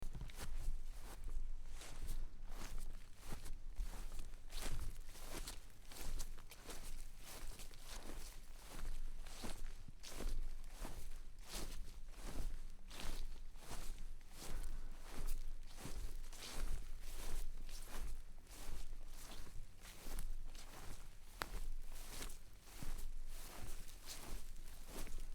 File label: walking barefoot over grass